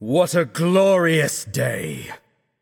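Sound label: nonverbal yay; voicepack; smite; merlin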